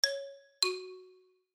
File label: descending 5th